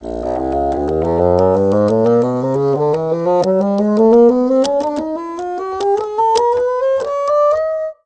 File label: bassoon range